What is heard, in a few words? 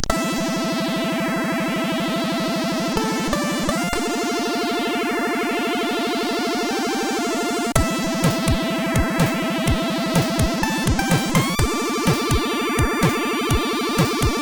Coops Ups, CSG8580R5; hvsc